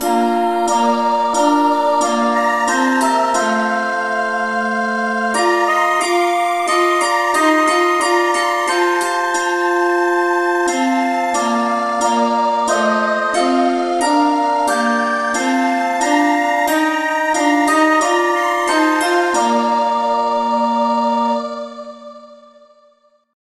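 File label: rev no vocal key B flat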